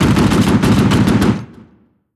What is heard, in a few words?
light airplane fire; Hearts of Iron IV Death or Dishonor